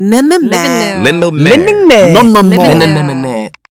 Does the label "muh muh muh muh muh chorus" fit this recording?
Yes